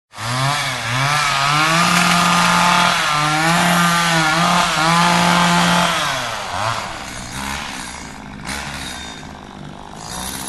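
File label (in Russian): benzopila, chainsaw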